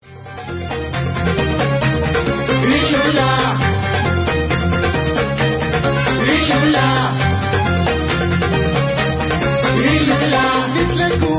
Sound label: Debka